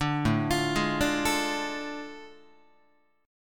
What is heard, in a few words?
A Major 7th Suspended 4th Sharp 5th, Guitar; arpeggiated